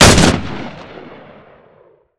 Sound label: stryker; weapons; ModernWarfare